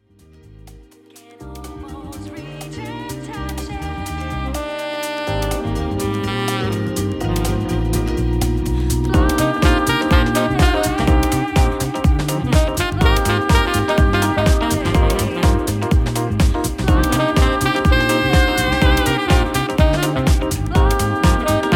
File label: sax in the house